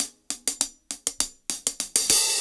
Cym Beat 99 BPM Hats; AMG - Keith LeBlanc Phat Butt Jams

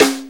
Rim Shot; Sonic Foundry (Sony Creative Software); Drum Tools

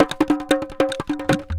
Indian Traditions; USB Soundscan vol.45; AKAI

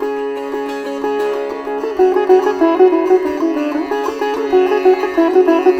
SITAR; Spectrasonics; Heart of Asia

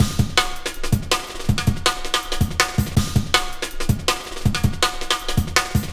Loops, breaks; M8

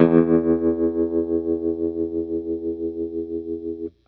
GRTCH TREM E1; Sonic Reality; American Heartland

